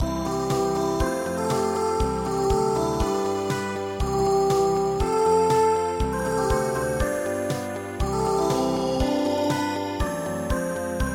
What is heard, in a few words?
yume humei ballade